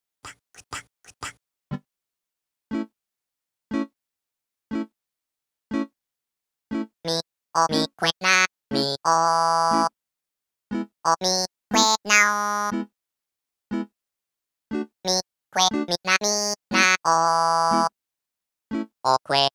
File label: Reggae; animal crossing k.k. slider complete songs collection